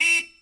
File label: horn short, street traffic; best service Blue Box